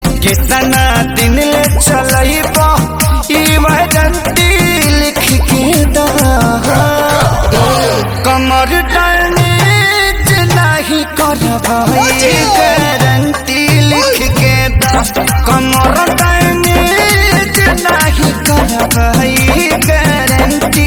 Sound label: Ringtone, Bhojpuri song